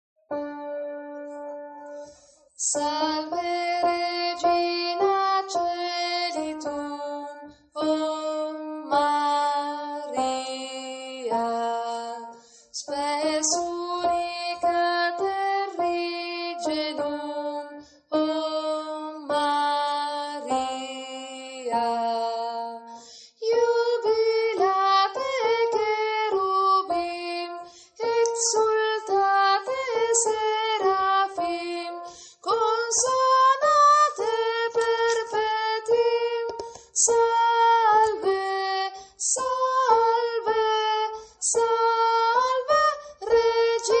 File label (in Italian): soprani; salve regina